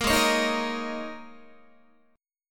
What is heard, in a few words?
Ab Suspended 2nd Suspended 4th Ab down Guitar; strummed; JGuitar